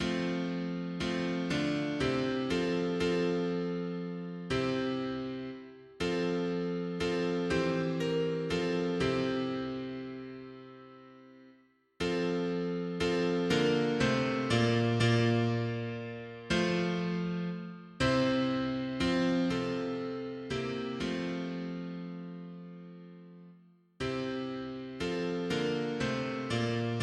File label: mono piano, hymns; Maitre debonnaire